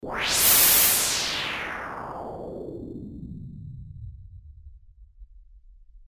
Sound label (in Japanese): kaze